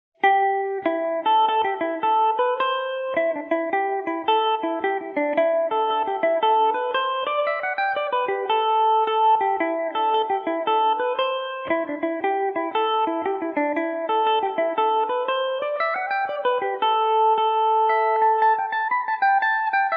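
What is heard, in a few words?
A dorian revelation, Irish mandolin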